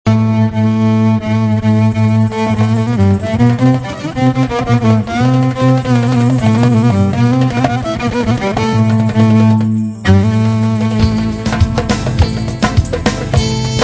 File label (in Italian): solo violi